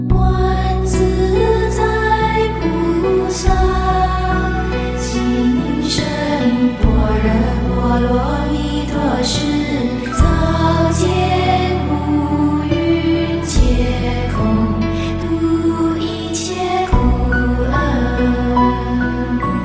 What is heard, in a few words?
Heart Sutra Chant